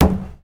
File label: wall barrel; Golden Crayon Sounds